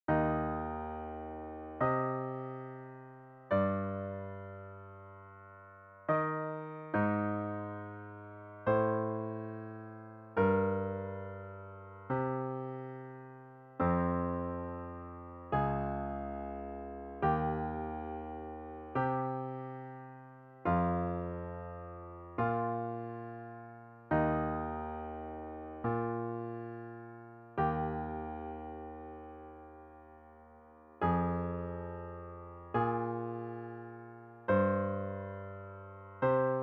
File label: solo piano